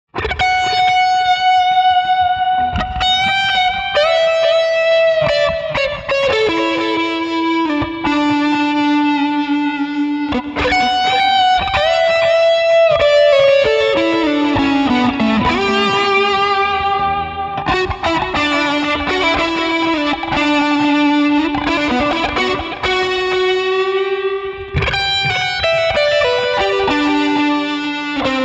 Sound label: COMFY Bridge, guitars